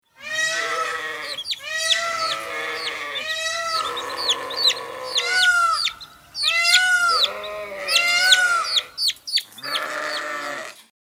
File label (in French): trio moutons paon poussin; loto sonore; loto des animaux